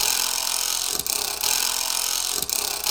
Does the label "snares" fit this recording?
No